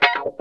Wah Scale; Mo' Phatt; Digital Sound Factory Mo' Phatt

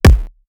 kick crumb

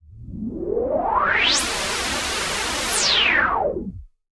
Juno Noise

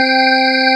magic C3; PlatinumPhattCD1; E-MU Producer Series Vol. 8 – Platinum Phatt; Best of Phatt

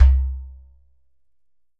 GHATAM

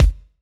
Kick, Kickdrum; Equipped Music; Loungin House

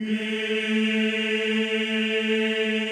CHO A2, Eeh; Eeh + Ehh

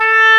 OBOE A3; WIND; AKAI S-Series CD-ROM Sound Library VOL-2

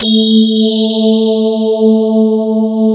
CMI PIANO; Wizoo - Powered DX

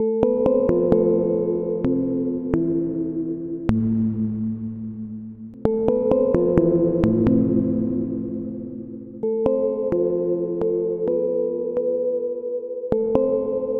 Arpeggio Line, Synths; Sonic Foundry (Sony Creative Software); Modular Electronica

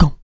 HIT, Jazz FX FEM PERC

